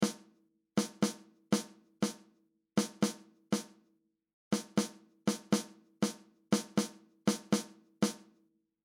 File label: rhythm